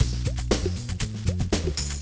shack loop; Soultree

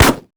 cardboard hit, bullet hit; projectiles; Max Payne

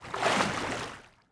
swim

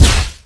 Plasma Impact, Plasma Rifle

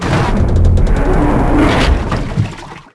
Pipe Flap; Finding Nemo; XBOX

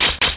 stab; Soldier Of Fortune II Double Helix; XBOX; sig551

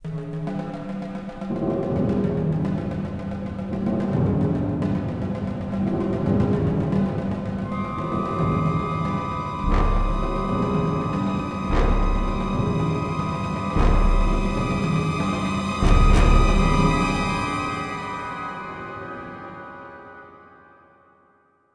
tension, music

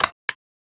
Traffic Light; Cars; XBOX; Ambient

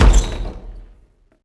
Door Swing Medium Open; Max Payne

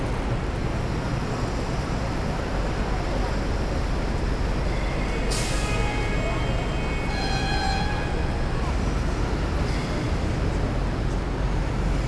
ambience; Street Hoops; XBOX

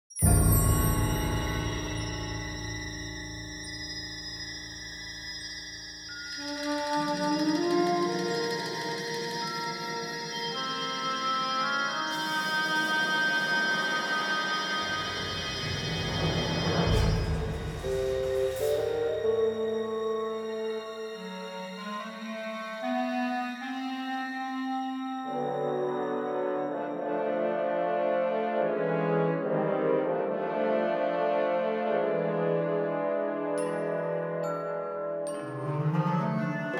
Ambient; Character Indy; XBOX

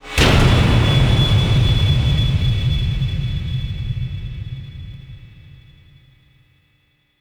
game over; XBOX; Fatal Frame 1

